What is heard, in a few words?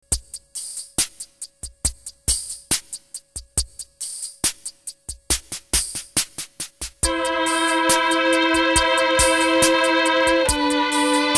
violins